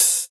clean open high hat d sharp key, Royality free open high-hat sample tuned to the D# note